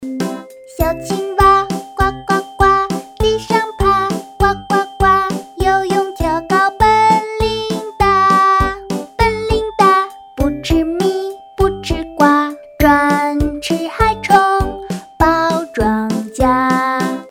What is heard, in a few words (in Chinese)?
儿歌试听